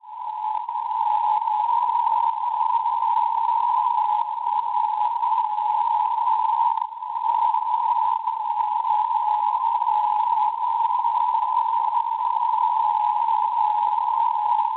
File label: NDB, 377 kHz; KN; Norrkoping; Svezia; Schwarzsee